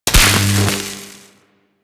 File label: Mining Laser Fire; Weapons; Eidolon Plains; Warframe; Eidolon Mining Lasers